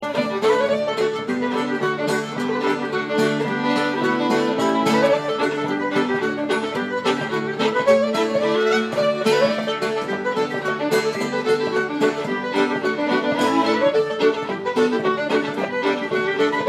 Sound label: Hornpipe; Fishers